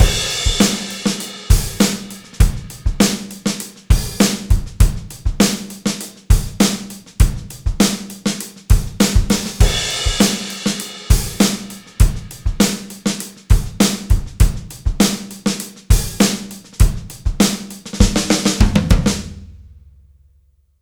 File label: drums 1073 heft